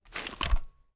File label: reload end; gmod